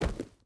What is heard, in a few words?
footstep rubber boots forest jump land; Rust